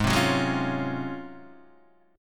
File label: Ab 13th Ab Guitar Half Step Down